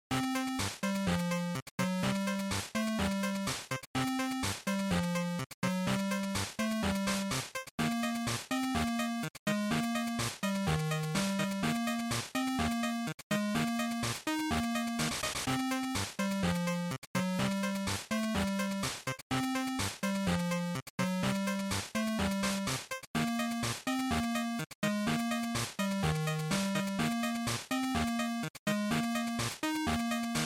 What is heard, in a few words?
GB Fighting Game Over